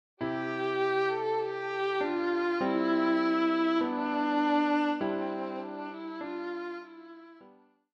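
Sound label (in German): Violine; Alle Jahre wieder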